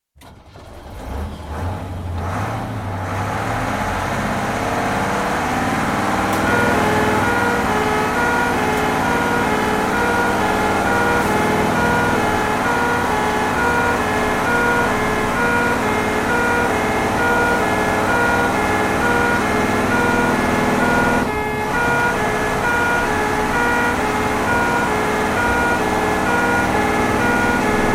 Fire engine, start into constant run with two-tone horns arrive, idle, off (Interior acoustic); Emergency Vehicle; BBC